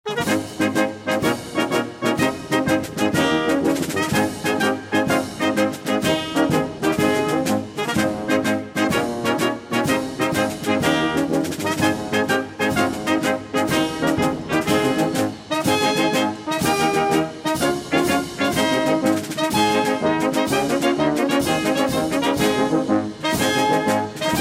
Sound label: Drum & Bugle; Bonnie Blue Flag